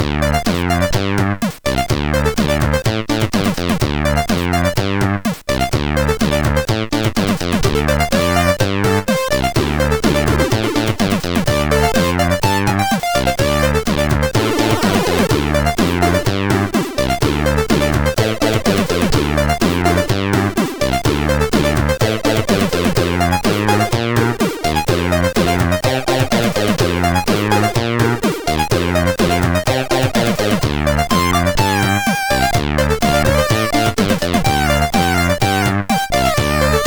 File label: sid CSG8580R5; Solo Mio